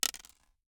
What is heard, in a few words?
DICE on Hard Wood, Throw and Roll, Mini, 2 Two Dice; Samples; ArticulatedSounds; DICE Sfx Party (Extended)